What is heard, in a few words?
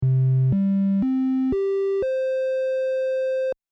special sound